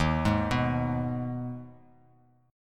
D sharp Suspended 4th Sharp 5th, Bass Standard; arpeggiated; chord; x,6,6,4; JGuitar

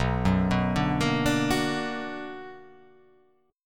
B Minor Major 7th

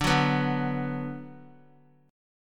D 6th, Guitar; strummed; x,5,4,4,2,x; 4E,4A,5D,5G,5C,6F; chord

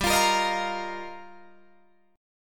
G Minor 7th Sharp 5th, Guitar, DADGAD; Gm7#5; strummed

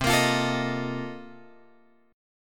C Minor 13th C

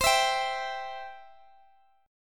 C Major, Guitar Open D

